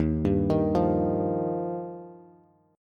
D sharp Major Add 9th, Bass; arpeggiated; D#add9; 11,10,13,10; JGuitar

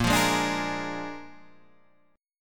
A sharp 7th A sharp, Guitar

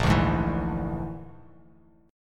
Bb-7th Flat 5th-Bb, Bass Standard, Bb7b5 chord; strummed; JGuitar

